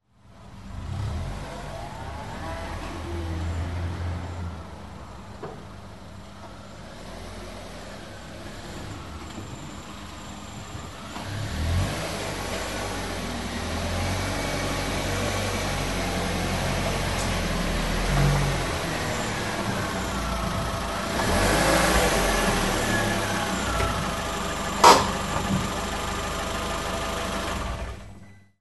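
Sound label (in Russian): zvuki avtomobilja, car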